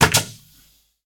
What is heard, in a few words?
Construction, Nail Gun